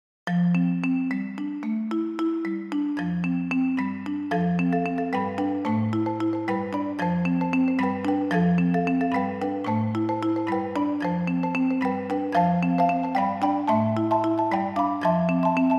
six marimbas

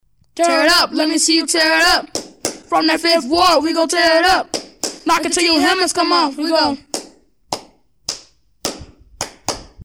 Cheer

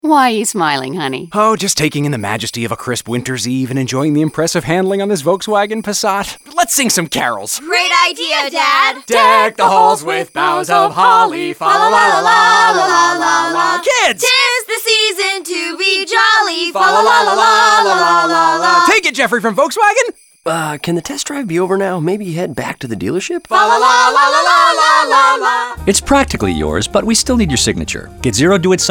Caroling with Bells

